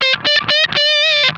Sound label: BIG LICK, Funk Guitar; Zero G - Funk Guitar